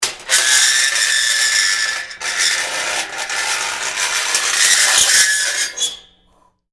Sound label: Metal Screech Intense High Pitch; Nasty metal grind screech, ear splitting screeching and clanking.; Metal Sound Effects